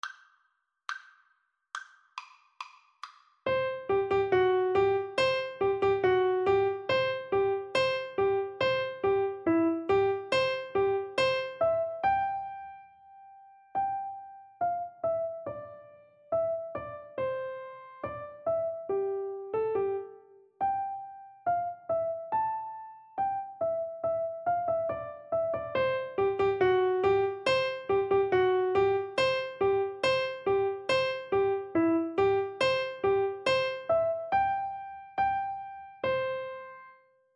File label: piano duet